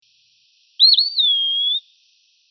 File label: Eastern Wood Pewee; Macaulay Library